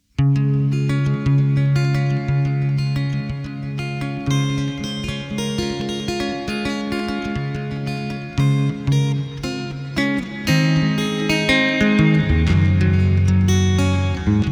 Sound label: Guitar Dance In D Major; E Rock